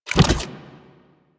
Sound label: Fan Energy Trench Activate Attach; Warframe; Protea